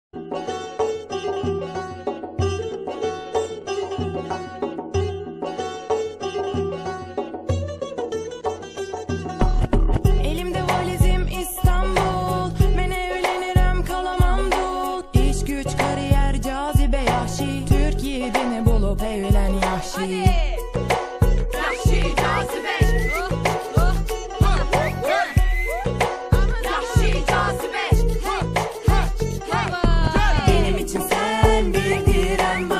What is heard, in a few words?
rap